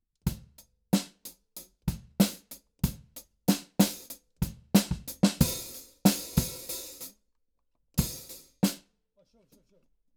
KIT

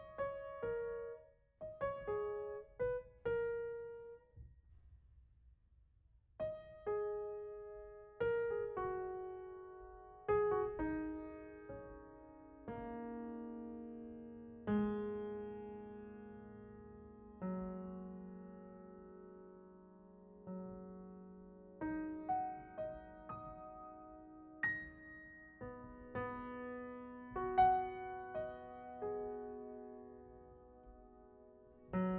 Piano Mono

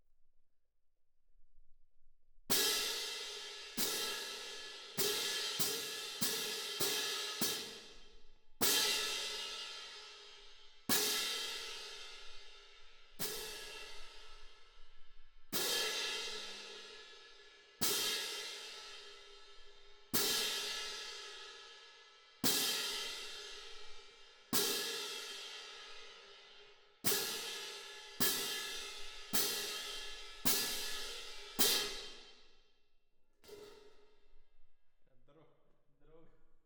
Cymb Main

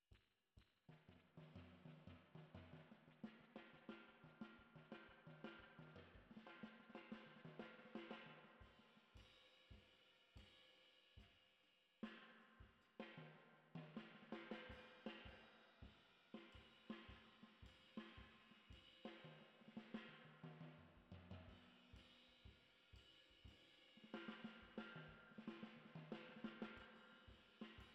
Spring

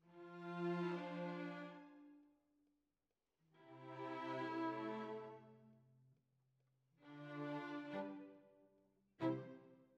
Violas, jazz for piano

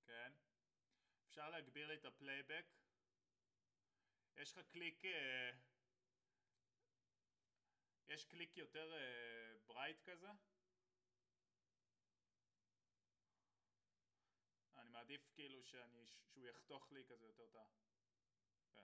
Ride

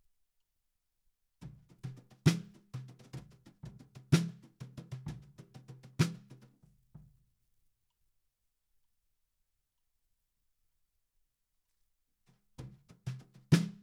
Snare Top

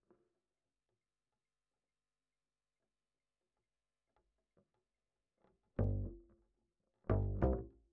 Bass Line

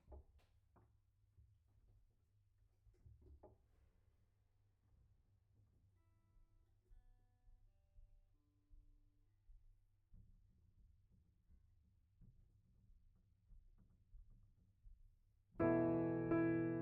Piano B

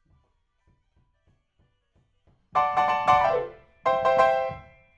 PIANO, RNR for piano

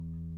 GTR

